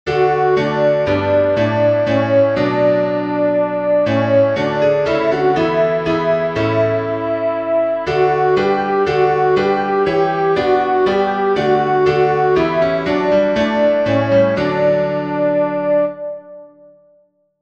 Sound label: swabia alto; by Johann Martin Spiess (1715-1772)